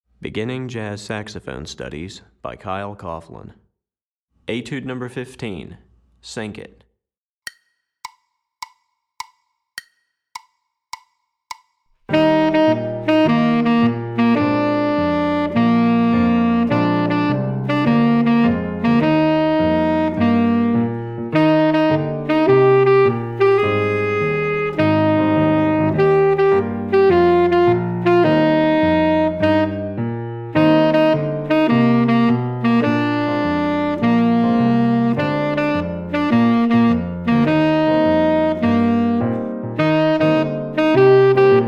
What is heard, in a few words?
Tenor Etude, Saxophone; Etude #15; Syncopation; Beginning Jazz Saxophone Studies for Tenor, Volume 2